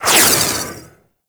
Rifle Alt Projectile By, Weapons; Warframe; Tenno; Gyre

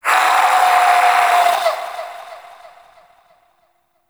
Vocal Idle; Warframe; Duviri; Shadow

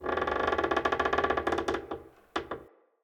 Structure Wood Creak Small; Ambience; Fort; Dendrit

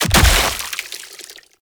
Dismember Gore Impact; Warframe; Weapons; Bullet Impacts

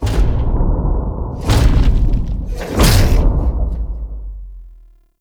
Erra Steps; The New War; Lua War Room; Cinematics

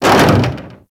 Arachnoid Camper Step Metal Debris, Enemies; Warframe; Corpus

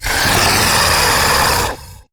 Death; Warframe; MiTW

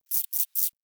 Forest Rodent Vocal Idle; Vocals; Warframe; Characters

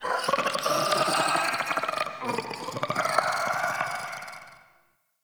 Create Vocals; Liset Alchemy Lab

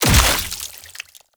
Dismember Gore Impact

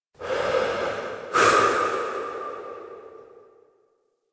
Tank Sniper Breath; Quincy; Warframe; NineteenNinetyNine; Stage12PartyOfALifetime

